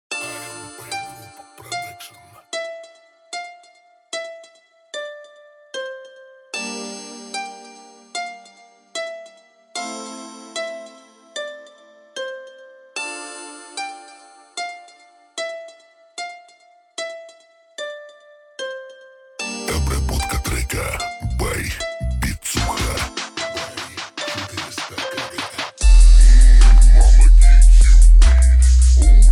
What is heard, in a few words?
Bass, Low Bass